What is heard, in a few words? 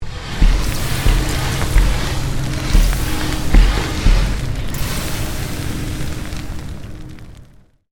Created Magic Spell Meteor Shower